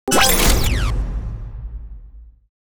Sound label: SWITCH